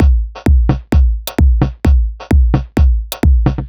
Fantasia 130bpm, House Drumloops; Zero-G House Fabrik Drumloops; ZG Drumloops

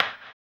HAMBONE, Vocal Blues Soul

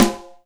Snare, Alesis DM5

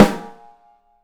OPEN BRASS, 3D Drums; 3D Sound

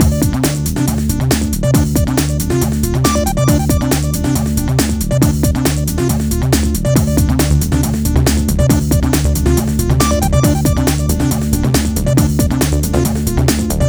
Moogyourass, 138 Dmaj, Hardcore Breakbeat; Big Fish Audio; Hardcore Breakbeat Action

